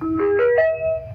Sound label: GONG; ZSSK